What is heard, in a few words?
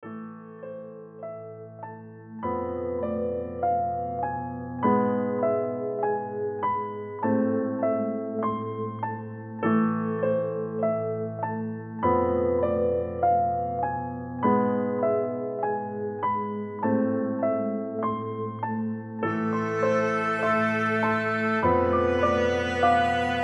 dramatic atmosphere with piano and violin